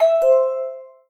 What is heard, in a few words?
Door Bell; KDE; Plasma-Mobile-0.1